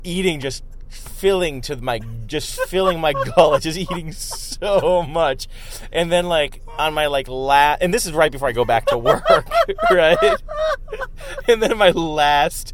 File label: awesome laugh